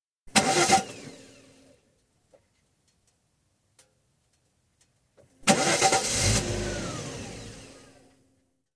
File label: Jaguar Sovereign, exterior, two false starts (36 litre, 1986/7 model); Cars; BBC